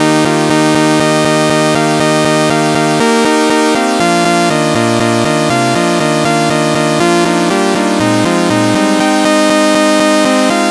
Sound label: sawtooth major pentatonic; Major Pentatonic Scale; music generation library in Rust